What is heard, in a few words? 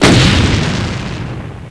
artillery explosion; tf2classic